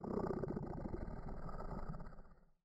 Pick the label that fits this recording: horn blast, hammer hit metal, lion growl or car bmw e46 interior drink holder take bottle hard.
lion growl